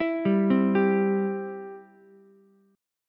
E Minor Sharp 5th E down Ukulele; Em#5 arpeggiated; JGuitar

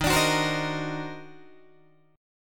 Eb Minor Major 13th, Guitar, DADGAD; EbmM13; strummed

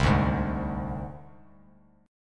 C Suspended 2nd Sharp 5th, down; Csus2#5 strummed; JGuitar

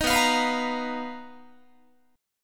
B Major 7th Flat 5th B down Guitar; strummed

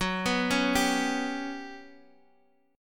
G Major 7th Flat 5th F sharp

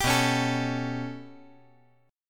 B 7th Suspended 2nd Sharp 5th down, chord

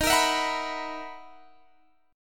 B Major 11th D sharp, guitar

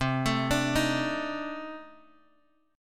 C Minor 6th Add 9th C down Guitar Open G

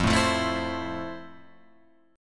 F Major 7th, Guitar, Open F; strummed

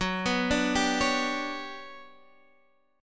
G Major Sharp 11th Gb, chord

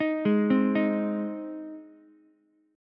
G sharp Major G sharp Ukulele, chord; arpeggiated; JGuitar

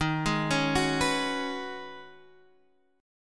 C sharp 7th Suspended 2nd Suspended 4th D sharp

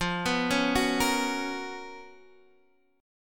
Db 13th F, guitar

